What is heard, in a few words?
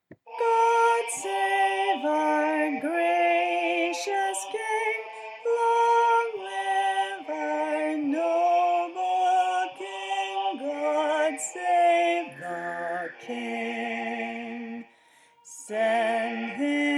bass; God Save the King; Voices of Remembrance; Folk Choir music